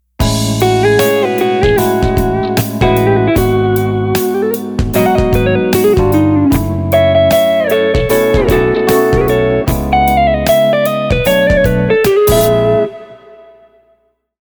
guitar pattern

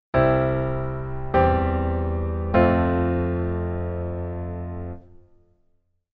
keyboard voicing low

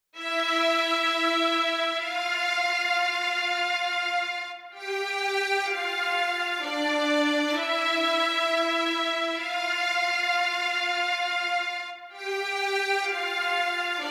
Strings

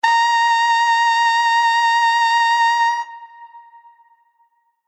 Horn Harm

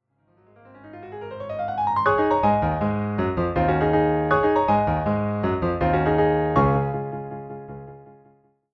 Orche melo

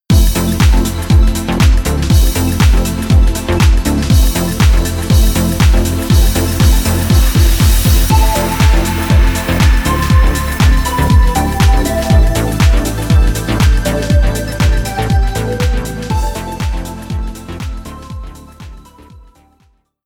Synth

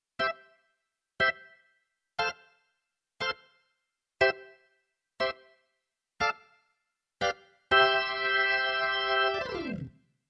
Keyboard Organ Reggae Organ

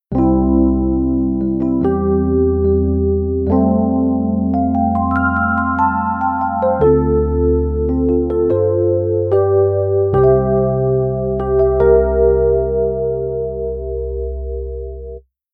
Chorus Flanger Case 2 After

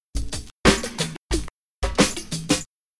Rhythm HipHop Kick

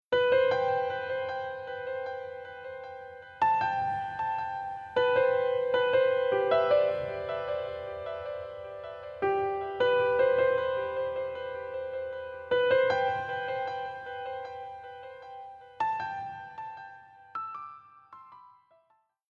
Future Bass Melo Delay Piano

